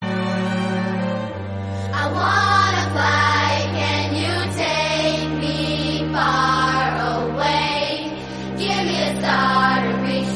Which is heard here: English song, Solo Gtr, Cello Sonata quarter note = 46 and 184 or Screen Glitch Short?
English song